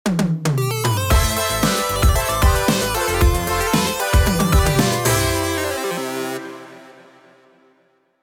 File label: Big thunder